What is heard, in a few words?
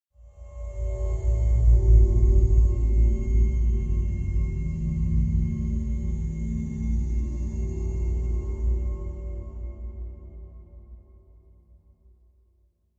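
Wash Elongated Low Elect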